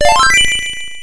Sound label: Coin